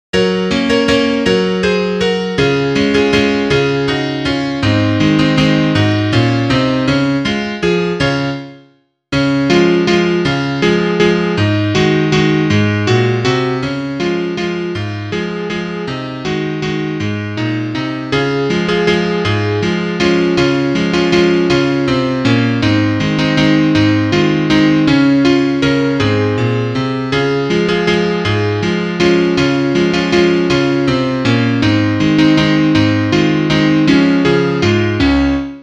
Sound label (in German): Klavier Solo, trompete; Volksliederpotpourri; (Duett)